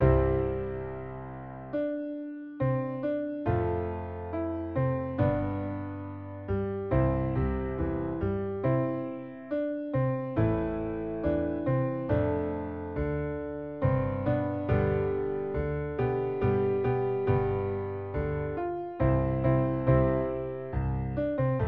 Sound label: piano song